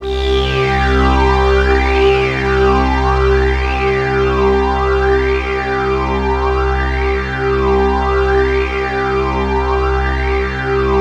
PAD C2 R, Jungle; Explosive Jungle; USB Soundscan vol.05; AKAI

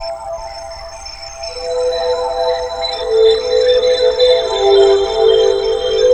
Western Pad, Jungle; M8

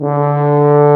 BRS TUBA F; Roland L-CDX-03

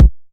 909 BD; Goldbaby Tape808 & Tape909; The Tape 909

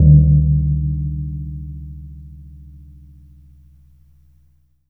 JAVA GONG D2, GAMELAN SET; Spectrasonics; Heart of Asia

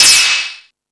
Rap Session, E-MU Pro-Cussion

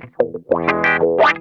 CROAKIN, Funk Guitar; Zero G - Funk Guitar

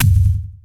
PTX TOM; Crypton - DrumBase5000

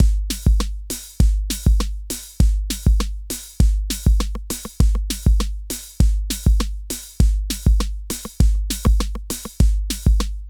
RAGGAE; INIS; Funky Xtreams

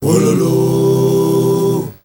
MALE GRP, M. CHOIRS, Heart of Africa; Spectrasonics; Heart of Africa 2

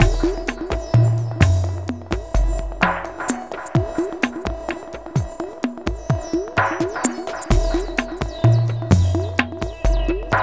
Ethnotronic, Processed Percussion

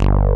RESO ANA BS, BASSES; Pro-Rec - Synthsonic

